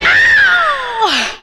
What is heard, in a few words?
"ow", ooh ahh, ow ouch